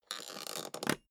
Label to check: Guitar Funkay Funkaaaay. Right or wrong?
Wrong